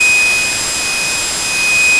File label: additive cello single; spectral convergence